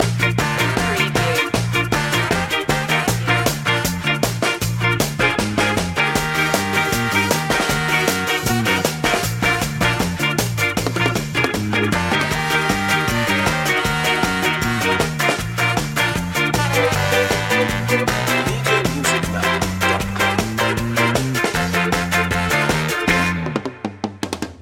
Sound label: ska shimmy loop; coolness